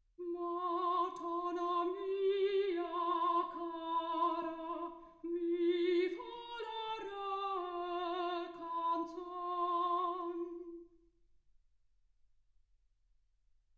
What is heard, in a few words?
soprano